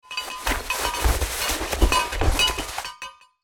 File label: Full backpack adjustment noise